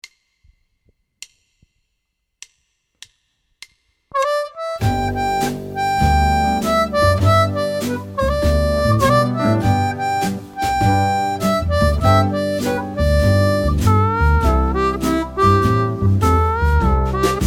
G 140 harp

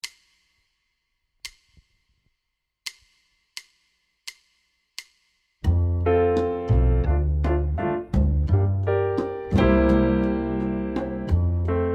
F 85 harp

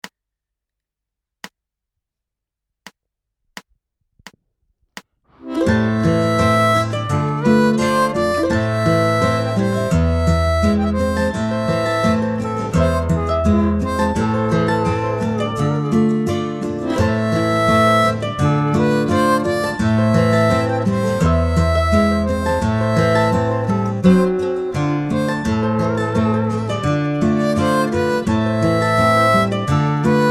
Swan Lake Am 85 harp

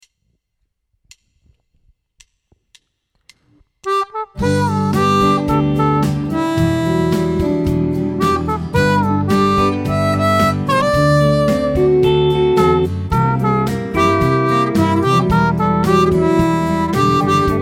G 110 harp

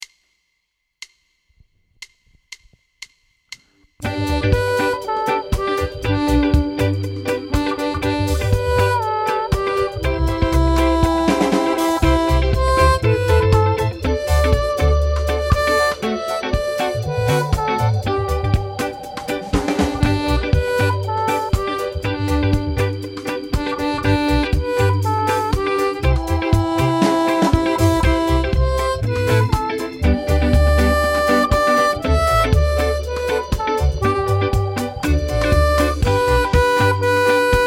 Jingle Bells 120 low harp

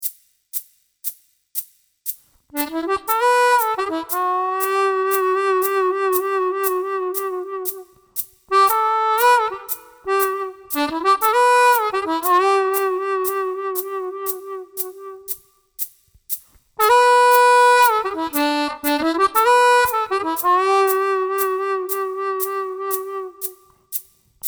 riff harmonica C

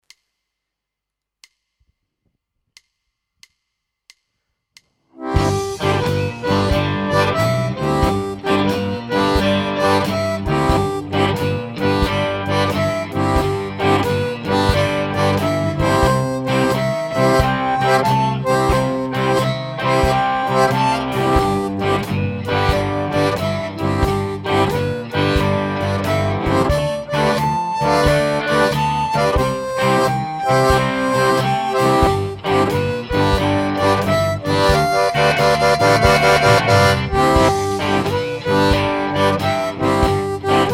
Shuffle Boogie 140; Средний пример; одиночными нотами при помощи техники блокировки языком

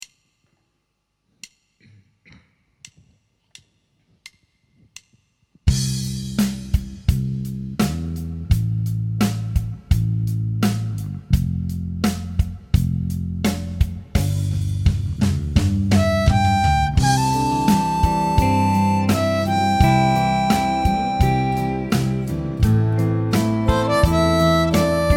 C 85 harp

